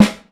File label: snare drum